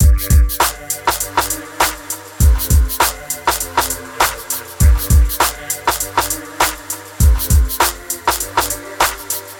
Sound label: Loopy Hip Hop Loop